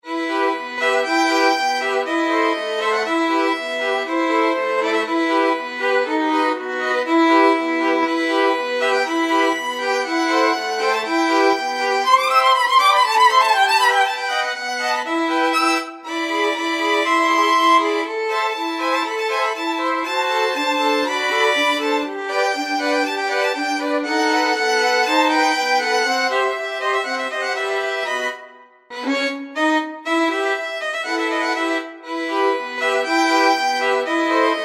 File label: hungarian dance, violin quartet; brahms